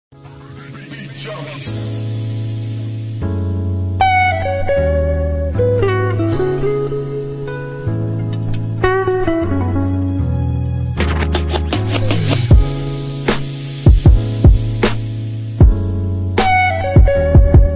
smooth hip hop instrumental

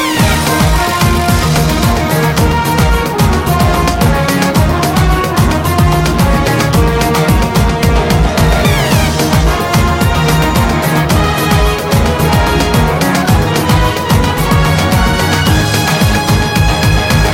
Monster Remix

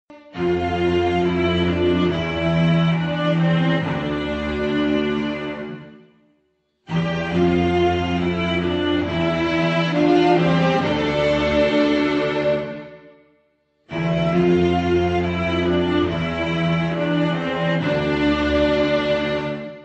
Saw Phone Message

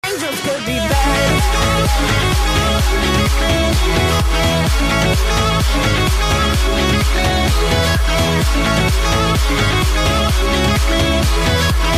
epic drop

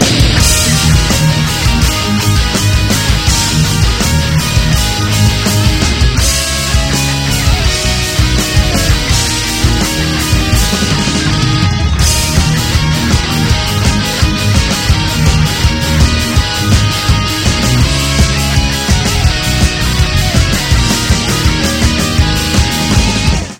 Tetris Metal

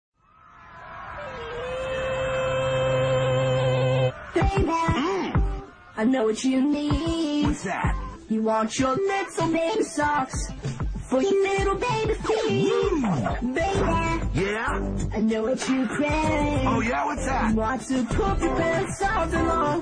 auto tune

